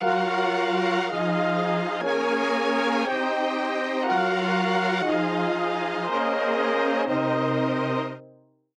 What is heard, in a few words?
Chords Full Soul; midi wizard 2; Unison